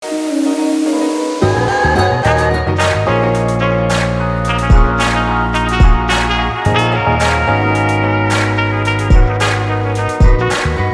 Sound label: HABANA TRUMPETS